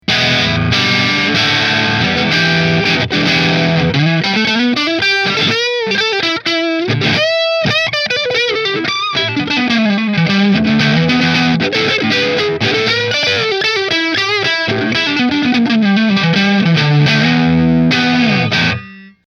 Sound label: Nola Model 8 Sunburst Neck Marshall; New Orleans Guitars Model-8 Sunburst